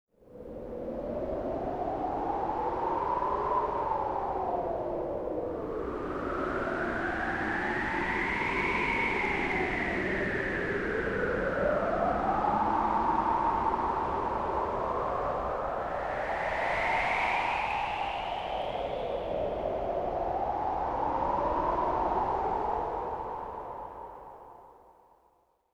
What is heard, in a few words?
Wind; ambience